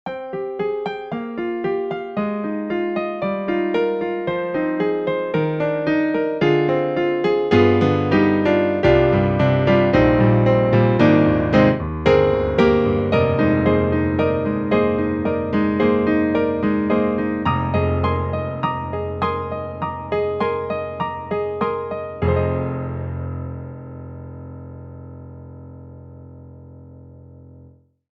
EDIROL Super Quartet Stage Grand

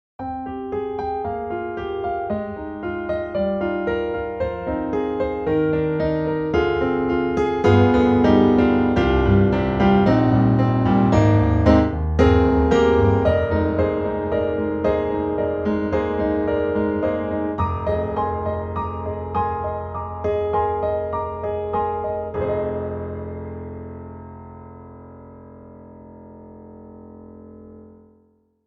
CFX concert grand; GARRITAN; Village Grinder

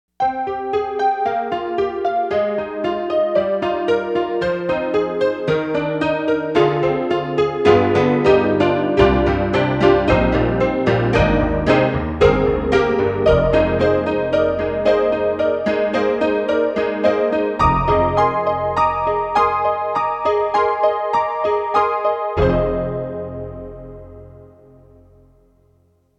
YAMAHA TG55 TG503 Honky Tonk

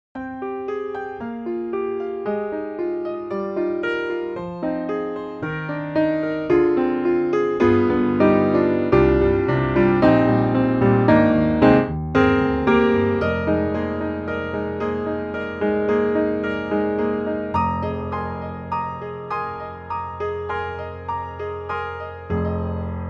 Schoeps MK4s; Soniccouture; The Hammersmith Professional Edition

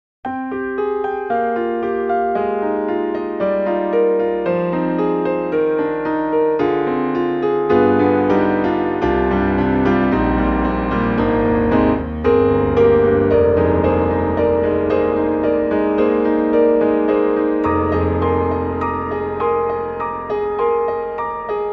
Rosewood Grand Delicate; Orange Tree Samples; Evolution Rosewood Grand

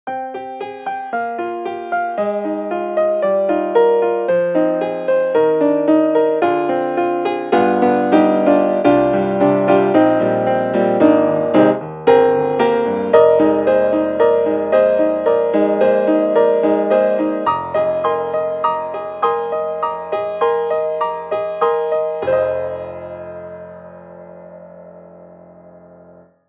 Vienna Concert Grand Baroque; Native Instruments

